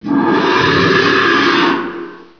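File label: ambience; svencoop; magnus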